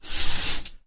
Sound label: curtain open